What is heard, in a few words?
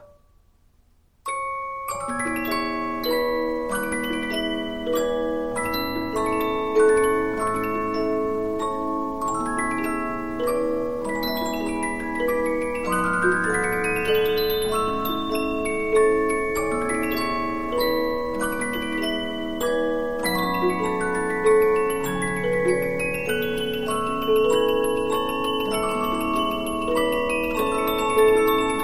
Polyphon; LANGE; Edited By Harada